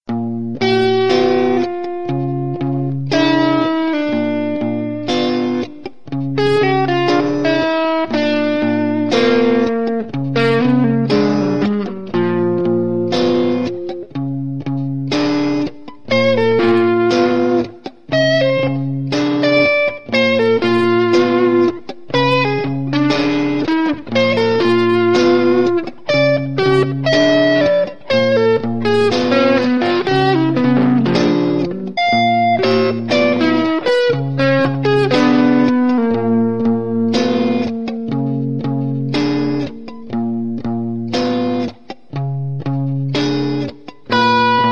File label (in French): guitares